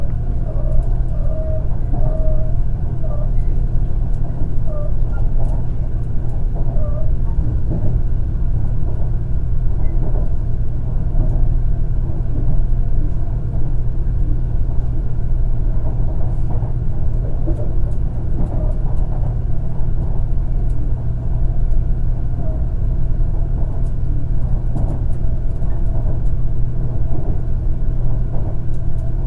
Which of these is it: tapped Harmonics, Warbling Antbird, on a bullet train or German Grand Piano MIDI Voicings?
on a bullet train